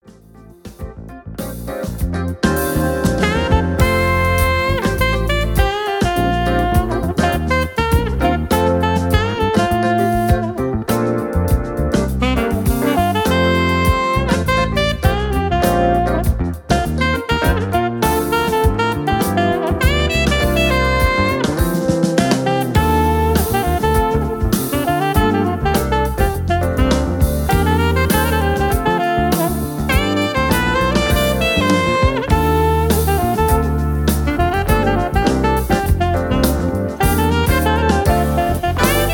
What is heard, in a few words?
New Age Band